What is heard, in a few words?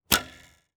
Arrow Trap; Minora-Game; Sound-Artlist